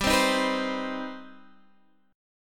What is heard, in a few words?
C Minor Major 7th, G, chord